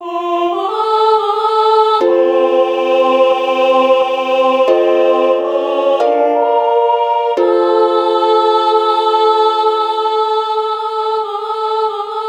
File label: UKE, ukulele